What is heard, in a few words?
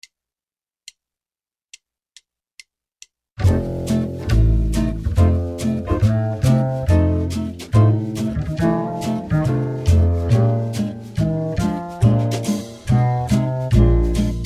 Horns